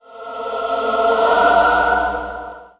heal powerup

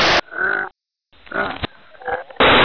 zombie grunt idle

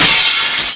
bust glass; debris

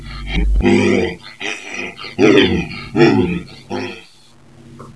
alert; flygare

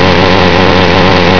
chainsaw cut into

